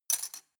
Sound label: cutlery plastic drawer place